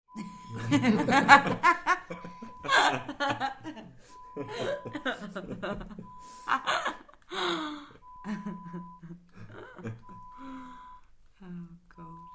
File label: Laughter, Small Crowd